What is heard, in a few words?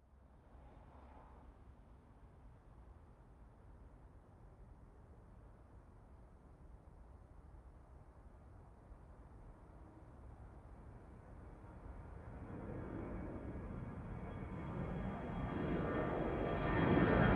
planes takeoff; sonniss gdc